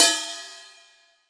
Subtle Reverb Drum Ride Sound G# Key, ride cymbal single hit